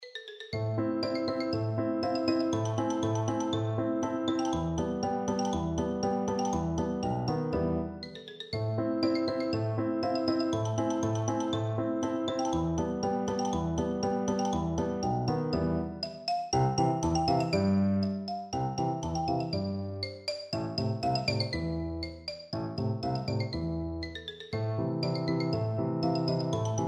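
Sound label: xylophone, percussion; turkish march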